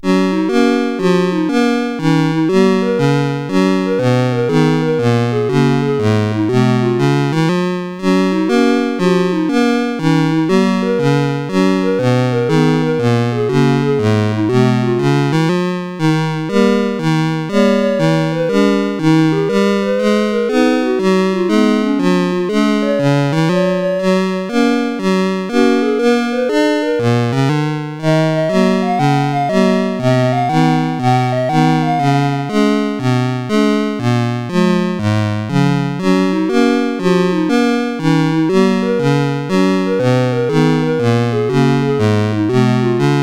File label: Demo, CSG8580R5; sid